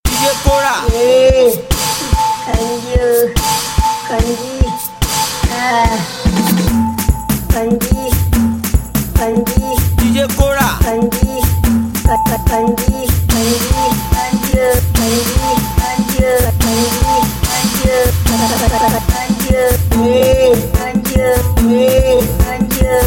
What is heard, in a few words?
Konji Beat Naija remix